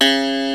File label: STENWAY; East West; Global Sounds